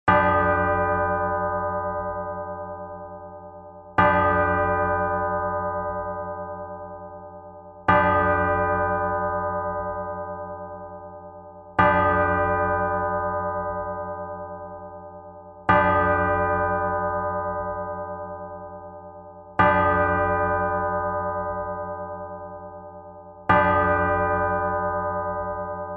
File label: church bell med large strike close clean; bells